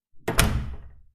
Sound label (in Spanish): puerta